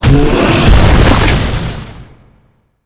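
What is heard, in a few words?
boss attack; monster; grogo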